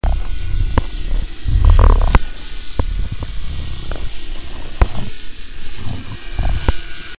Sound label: whistles boat; dolphin